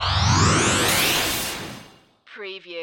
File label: Magical Ascend Burst